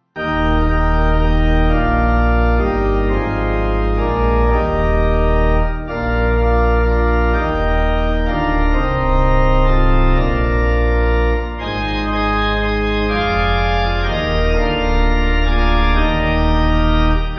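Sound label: Pipe Organ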